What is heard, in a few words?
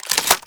shotgun reload; weapons